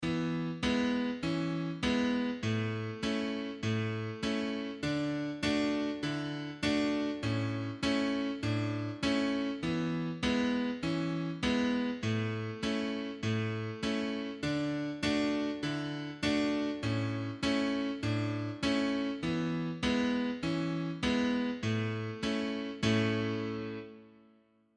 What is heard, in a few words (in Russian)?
Chastushka major A